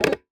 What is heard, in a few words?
Single Shunt, DVD Drive External